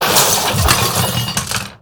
Mecharoo Death

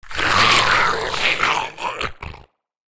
Ravenous Cave Crab Death

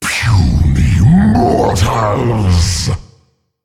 Male Dragon Attack

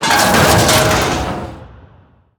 Golem Death; Sinfueled

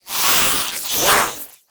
Deathweb Spider Attack

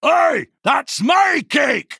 Male Dwarf Attack

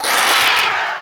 Raven Familiar Play

